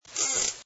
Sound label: j buzz, orca